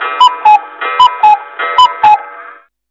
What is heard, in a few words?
cuckoo clock